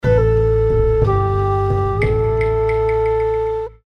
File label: game lose; ChangChunMJ